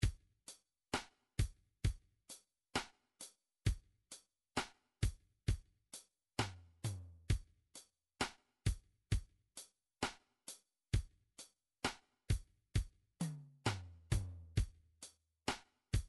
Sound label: midi drums ballad